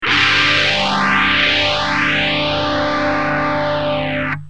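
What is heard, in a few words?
guitar phz1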